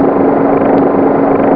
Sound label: chopper; Knockoff